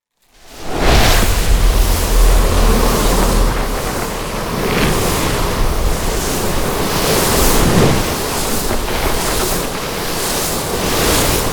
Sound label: elemental sand cast; soundmorph